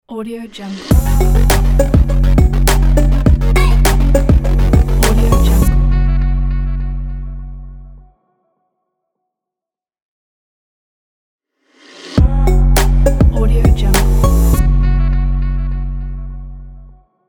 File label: future trap logo